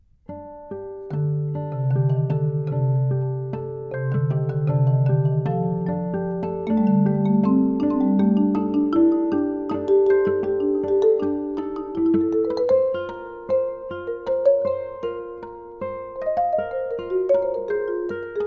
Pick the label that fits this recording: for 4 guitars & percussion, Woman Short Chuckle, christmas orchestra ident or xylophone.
for 4 guitars & percussion